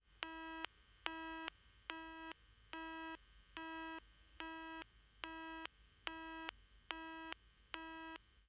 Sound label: Telephone, Occupied Signal, Hotel, Vietnam; Backgrounds